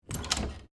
Door Knob Turn, Foley, Door, Knob, Turn, Hard, Wood, Bathroom; Miscellaneous Door